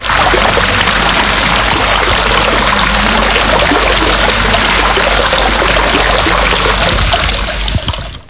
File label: Water run